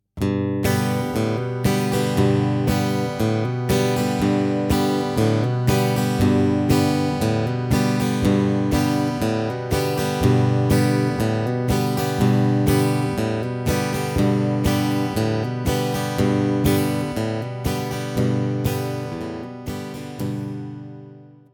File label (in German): Anschlag, begleitung